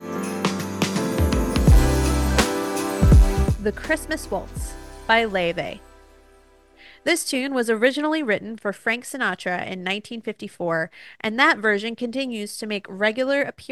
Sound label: Waltz